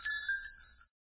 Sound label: windchime; ambient; canals; source engine; hl2; video games